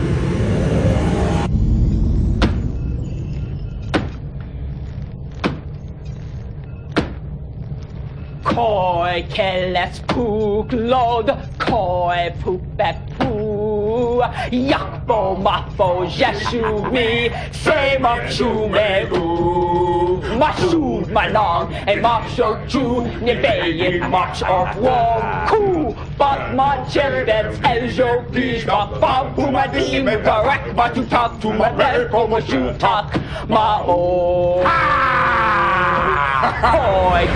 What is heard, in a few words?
klingon anthem